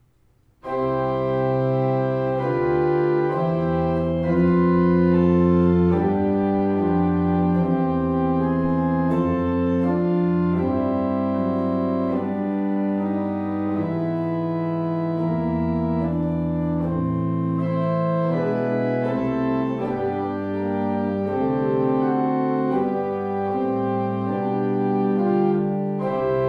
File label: Vorspiel in C, orgels; Wilhelminaoord; Stern